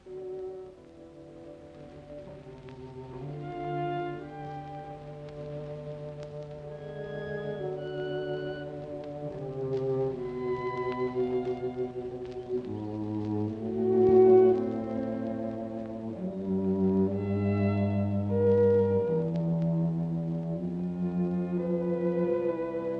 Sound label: String Quartet No 5 BB 110 II Adagio molto; Bartok